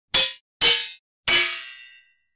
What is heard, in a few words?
Sword fight with a ring and shield